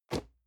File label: cardboard box medium place soft; objekte